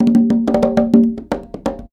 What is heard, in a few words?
Percussion Loops, Bongo & Conga; Houseworx